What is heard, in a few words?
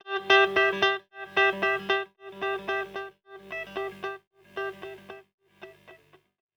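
guitar, Roots Funk; USB Soundscan vol.41; AKAI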